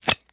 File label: Chopping Off Fingers, Quick Juicy Chops.